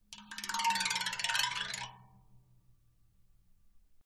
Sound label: waterphone bow scrape rods condenser; aston origin